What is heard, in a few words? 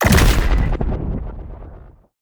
Sci-Fi Grenade Explosion